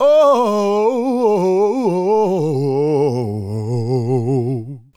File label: Adlib, Hip-Hop and RnB Vocals; Sonic Foundry (Sony Creative Software)